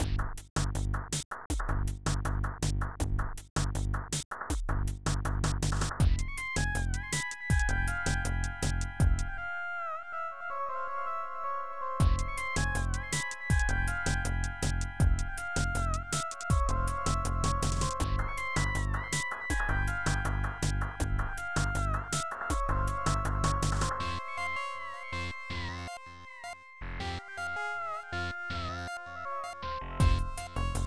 keygen song

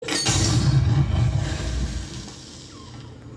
Door

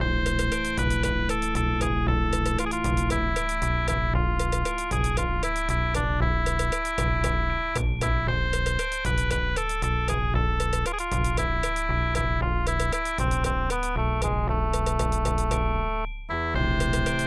keygen song

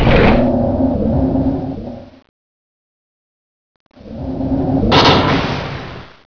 elevator

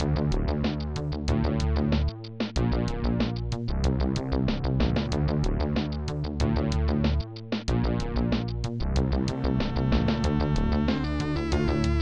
keygen song

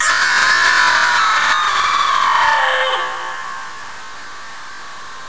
scream

